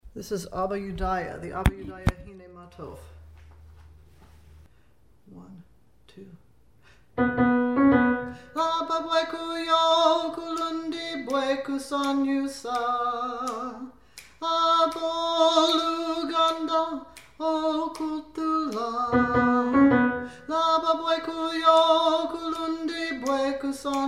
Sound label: alto; hinei ma tov abayudaya